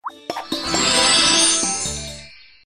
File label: draw card ten